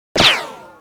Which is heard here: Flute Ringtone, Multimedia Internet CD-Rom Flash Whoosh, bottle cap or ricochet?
ricochet